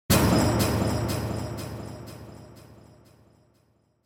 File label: Impact High Delay; Onoma